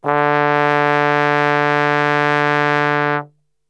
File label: D 1 Brass Super Section; Best Service; TRMBSTRGHT; 90 s Sample CDs